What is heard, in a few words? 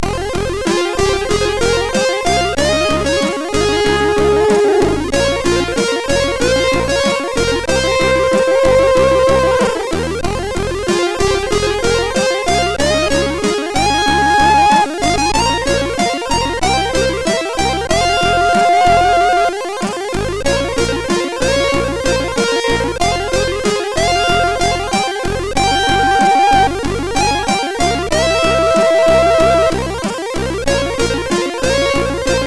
end sax, MOS6581R4